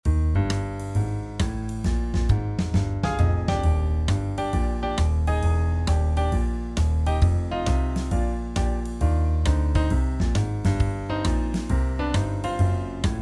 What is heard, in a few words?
Jazz